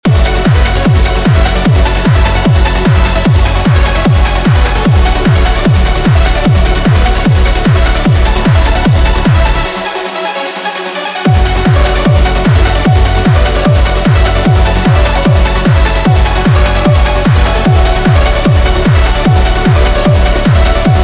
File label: Trance